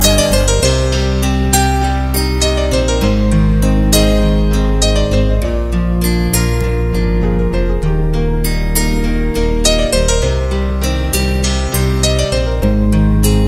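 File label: music Bangladesh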